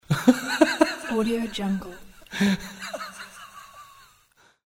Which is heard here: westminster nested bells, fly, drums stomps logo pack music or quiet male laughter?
quiet male laughter